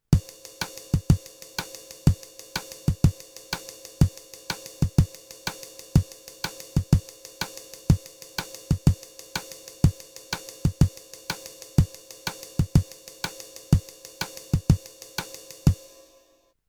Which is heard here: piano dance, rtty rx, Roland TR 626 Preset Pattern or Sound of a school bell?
Roland TR 626 Preset Pattern